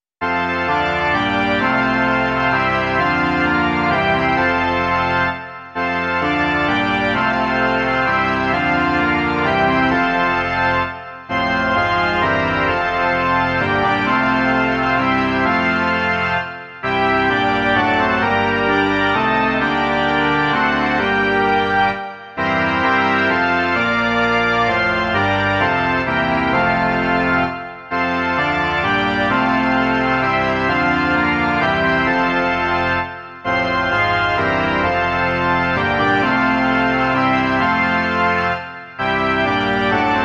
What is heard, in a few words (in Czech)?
varhany